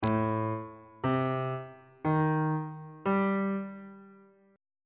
a sharp ionian 7th chord 7 is g double sharp half diminished 7th on bass clef